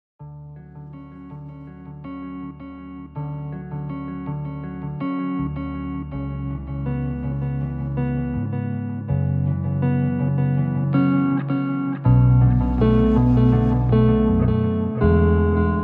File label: Lo-fi